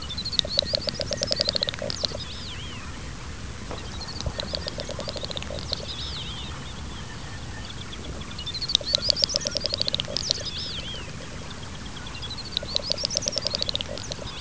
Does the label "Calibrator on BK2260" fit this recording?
No